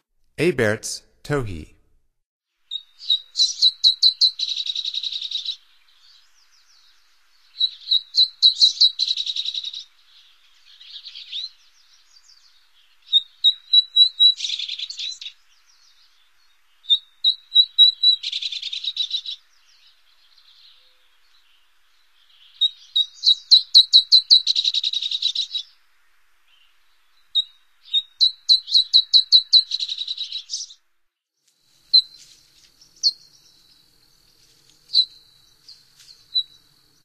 Alberts Towhee, Songs of Birds of North America; Stokes

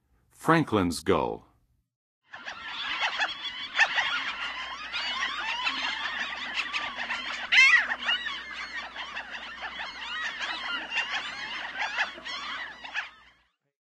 Franklins Gull, Western USA Songbirds; North America

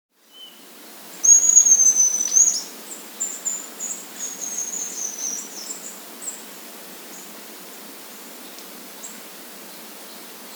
Scarlet-Breasted Fruiteater; S. America

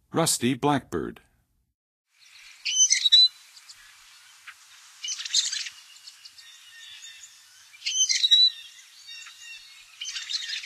Rusty Blackbird, Songs of Birds of North America; Western USA; Western USA Songbirds

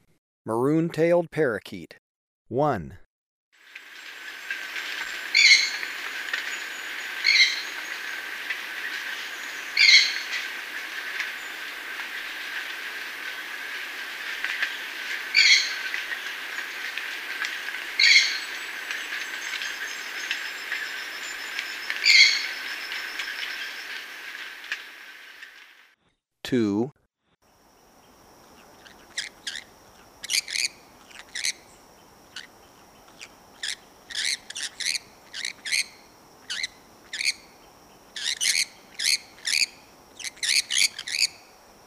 Maroon-Tailed Parakeet, Birds of Ecuador; S. America

